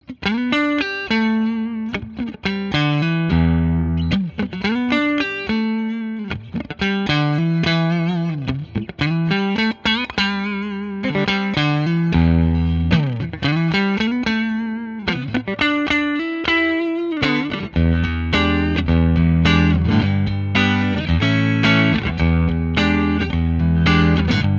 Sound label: deluxe strat